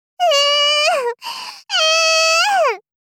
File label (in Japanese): え～んえ～ん 幼女×高音域